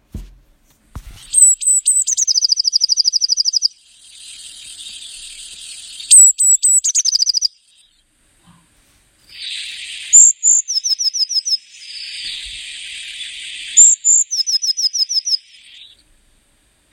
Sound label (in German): Blaumeise, Vogelstimmen